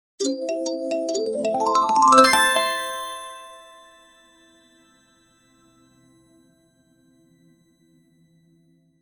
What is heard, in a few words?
Magical Sparkle Sound, cartoon sound effects